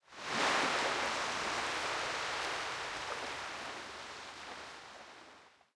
beach; portlux

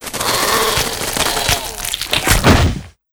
deth; cryonics

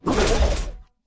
guardian damage, monster; legacy guardian

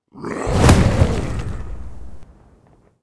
death, monster; mutroop

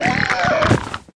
boar dead

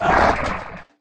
ice ghoul damage, monster

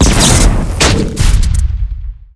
machine dead, monster

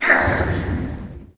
elder lich damage, monster

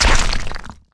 beetle dead, monster

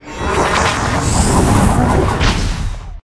guardian attack, monster

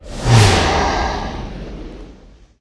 mummy mage attack, monster

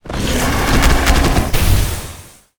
Boss Gorilla deth; monster

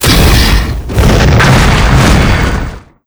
boss demonkin, monster

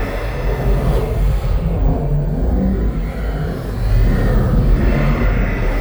boss demonkin main, monster

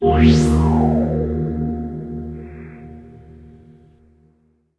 fx revival char

